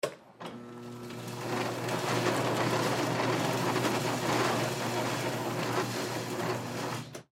Rotary Grinder, Rotary Grinder Leveler Turn On Rumble Turn Off Short; Grinders; Industry; Blastwave